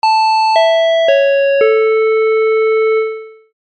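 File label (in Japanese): お知らせ・終わり・遅め; 戏剧音效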